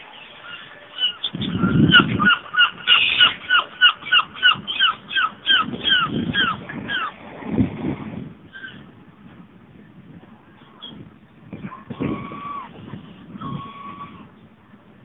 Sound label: long call